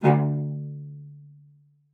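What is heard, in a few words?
cello spiccato D5, cello; Xsample VOL-5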